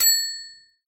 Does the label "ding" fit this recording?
Yes